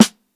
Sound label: SNARE MED; ONE SHOTS; SNARES; breaks; Basement drums vol 2